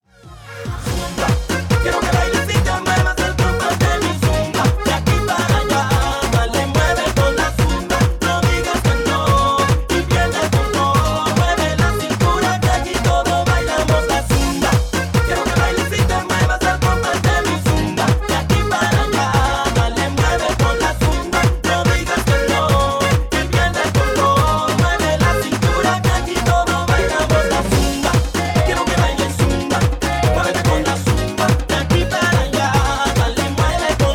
latin explosion; multitrax

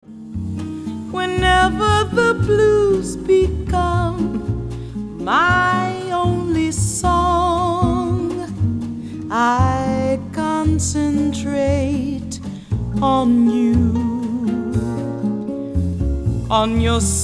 smooth jazz chillout; multitrax

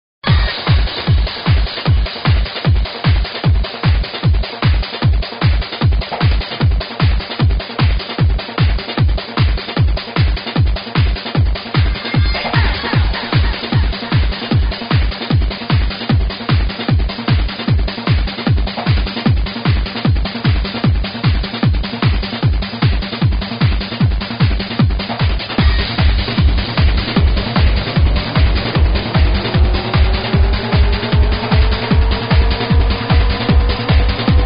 kick; multitrax

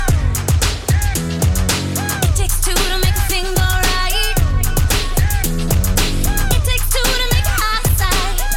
funk; multitrax

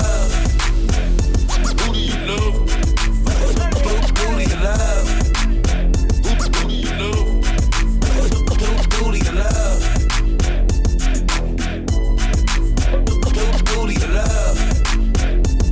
funk; multitrax

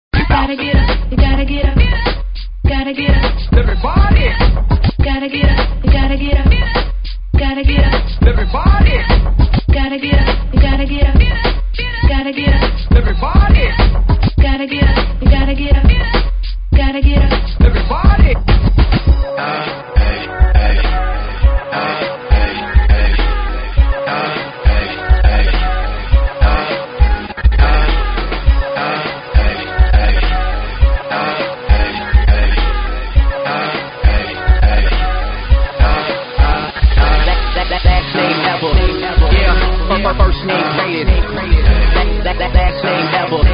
funk; multitrax

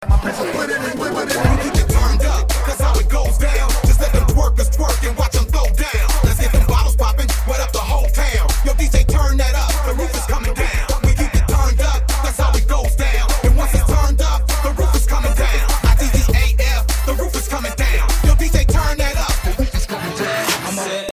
funk; multitrax

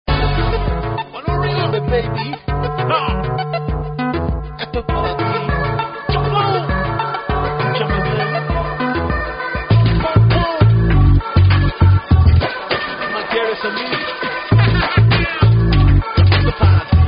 reggaeton, fitness beat, funk

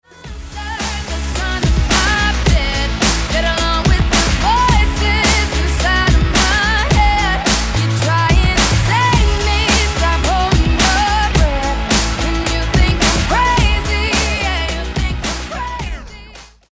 funk; multitrax

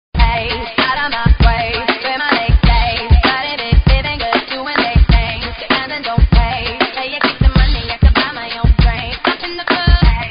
funk hiphop

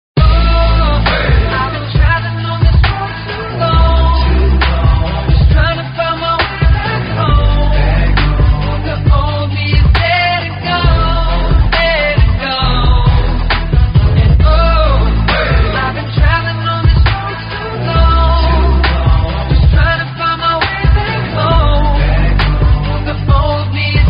funk; multitrax

